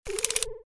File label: monster